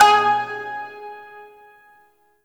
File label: Shawzin Oct Two G Sharp; Warframe